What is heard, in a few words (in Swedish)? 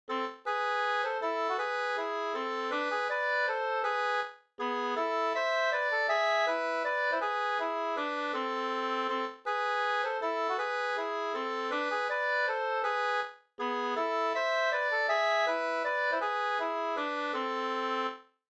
langdans; Midsommardans fr Da o Vs Ahlstrom 2st